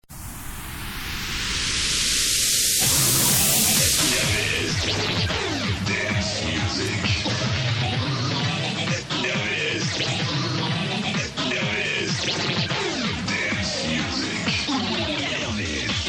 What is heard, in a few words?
dance music; Le Hit des Clubs nov 90